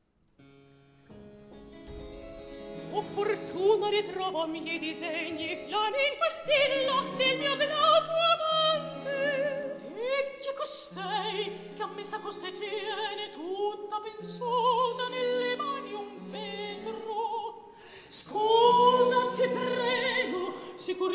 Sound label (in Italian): Recitativo; Opportuna Ritrovo a Miei Disegni; Polifemo